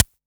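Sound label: Analog SY1 Snare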